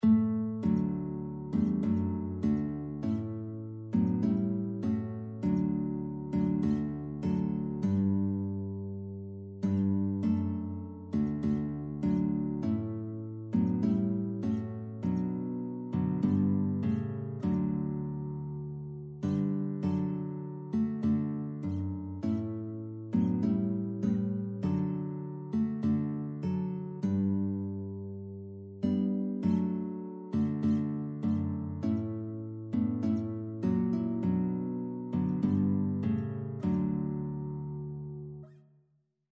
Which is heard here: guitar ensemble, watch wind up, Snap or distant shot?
guitar ensemble